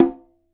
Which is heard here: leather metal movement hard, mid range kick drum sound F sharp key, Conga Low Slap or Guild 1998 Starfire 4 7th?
Conga Low Slap